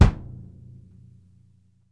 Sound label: AMBIENT ROO, KICK DRUM; Sampleheads; New York City Drumworks VOL-1; SP KICK DRUM